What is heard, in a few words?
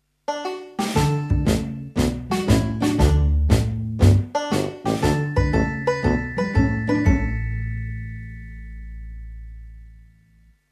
FUNNY RINGTONE; SHAUN THE SHEEP